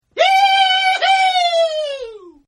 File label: Yell; Partners In Rhyme